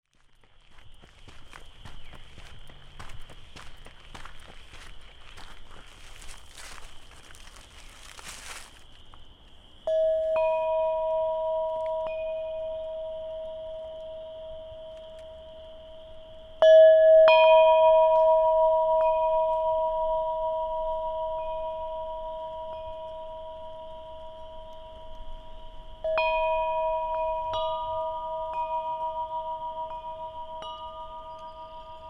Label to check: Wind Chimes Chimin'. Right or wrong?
Right